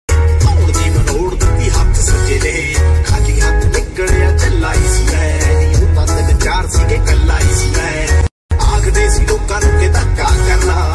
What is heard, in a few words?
Dance Punjabi Ringtone